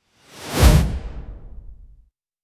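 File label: whoosh for 3d camera transition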